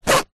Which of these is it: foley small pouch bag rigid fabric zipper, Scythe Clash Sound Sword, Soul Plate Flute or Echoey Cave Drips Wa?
foley small pouch bag rigid fabric zipper